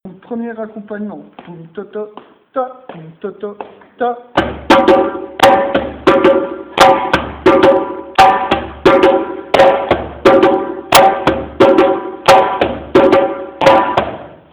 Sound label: Djembé; Kuku